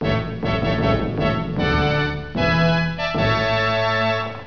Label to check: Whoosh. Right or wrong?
Wrong